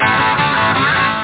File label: trick; tritus